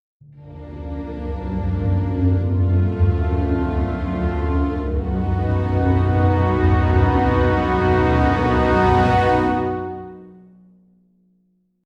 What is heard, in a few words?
Scifi Audio Logo Ident Classical Epic Summit; Lynne Music